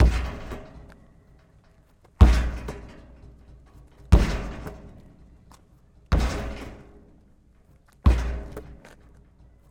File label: Basketball street game - Basketball hits backboard hoop multiple times and catching it with two h; Basketball hits backboard hoop multiple times and catching it with two hands, outdoors; Sound Ex Machina; BASKETBALL GAME ULTRA